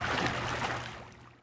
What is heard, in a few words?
water wade